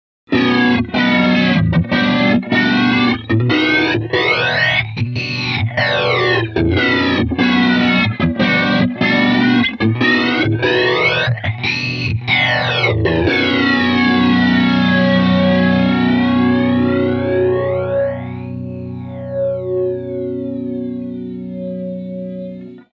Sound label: flanger; mobius